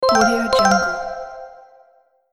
notification double alert

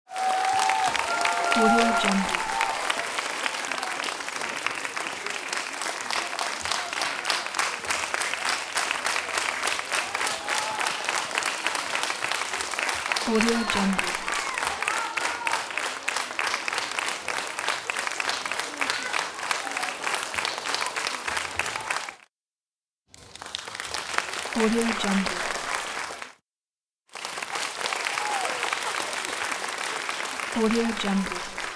crowd cheer applause

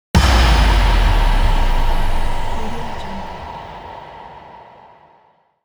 explosion impact

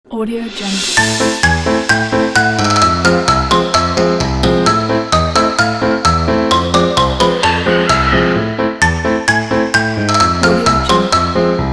halloween; asikprod

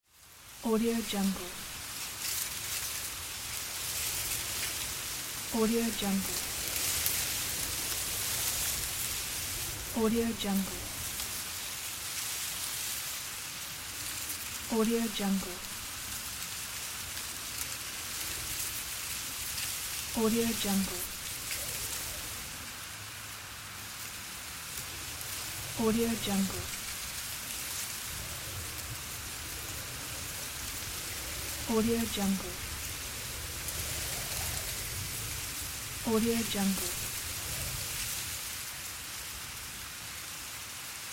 rustling leaves

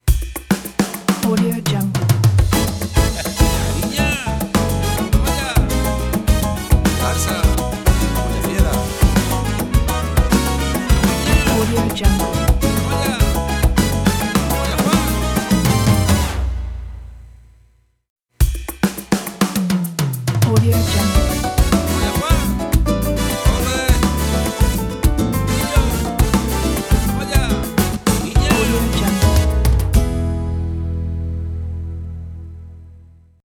mexican party logo

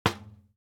object office trash basket metal hit bottom; smartsoundfx

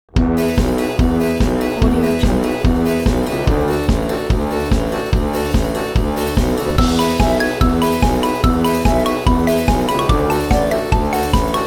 circus

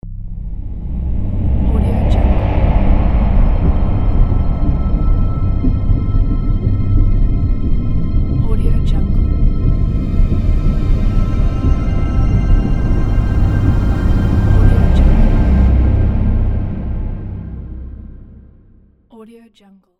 fear and tension; ab soundproduction

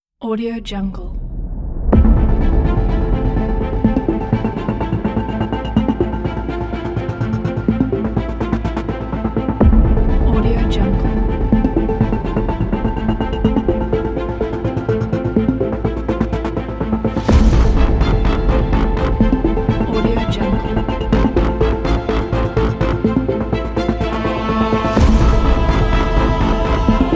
trailer intense blockbuster

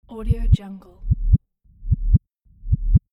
heartbeat

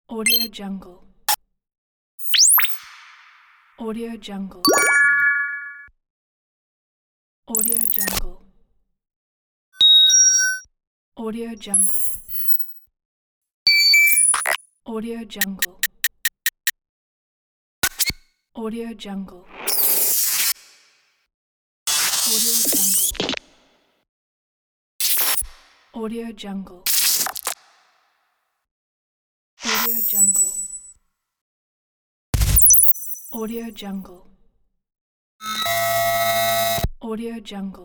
game micro movements pack

